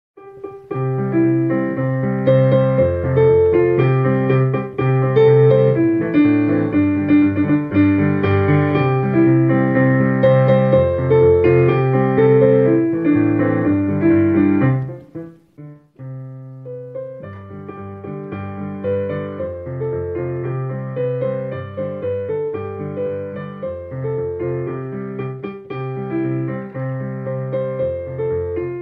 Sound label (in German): Instrumental Klavier leise werdend